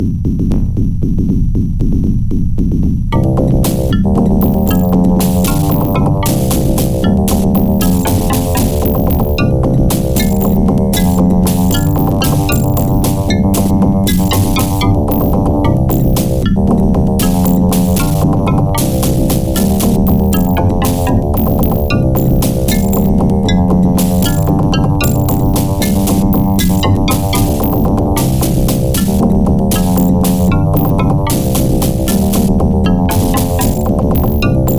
Options Menu; timeslaughter; ms dos gamerip 1996; Mojumbo

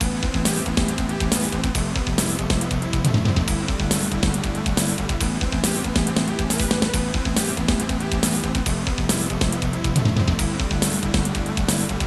Winning; marvel super heroes; arcade; WOLVERINE

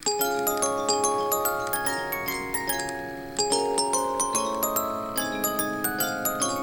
Boxy Jingle; Project Playtime

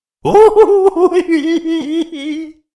Hehe; Freaky Clown Town Mystery; windows

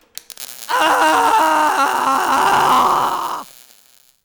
Battery; This Is the Police

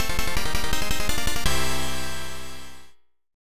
Complete Victory!

